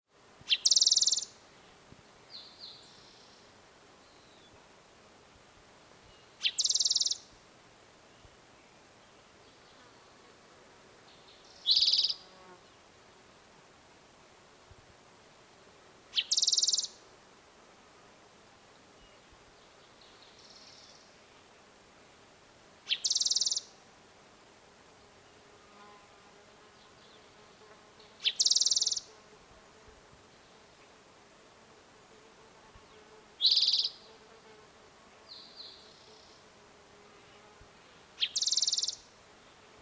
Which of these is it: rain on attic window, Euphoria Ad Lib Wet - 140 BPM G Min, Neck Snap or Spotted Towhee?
Spotted Towhee